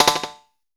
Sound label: SYN FX, FX PERCUSSION